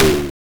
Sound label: Power Tom, Genesis